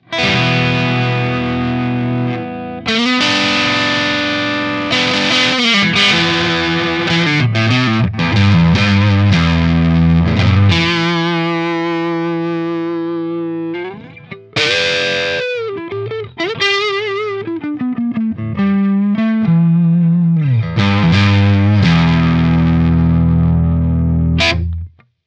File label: Superfast 100 Crunch; Guitar Rig 7